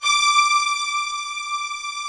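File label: string, orchestral strings; Greytsounds VOL-1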